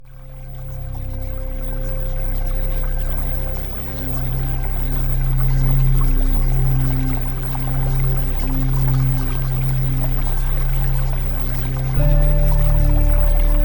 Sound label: Music; EMOTIONAL HEALING; Premium Subliminals; Emotional Mastery